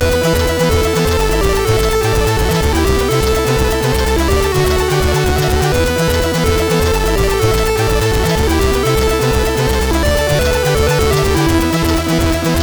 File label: Super Bass, CSG8580R5; sid